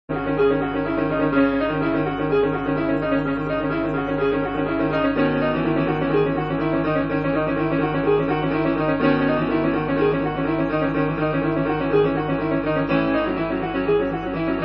Piano

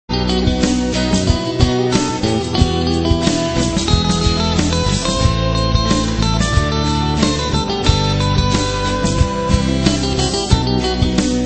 sax

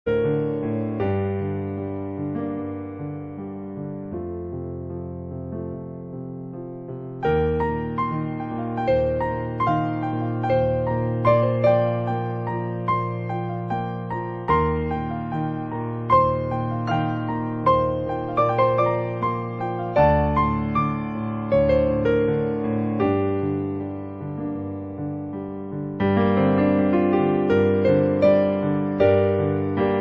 Piano

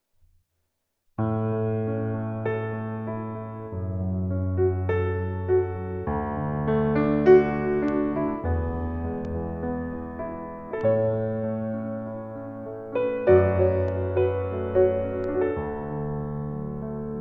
Live Piano; Commando Highscore

